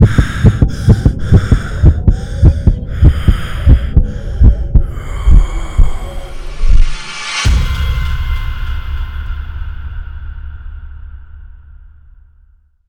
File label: Transformation, Infection; zps